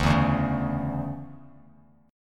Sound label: D Major 7th Suspended 2nd, Bass Standard; strummed; JGuitar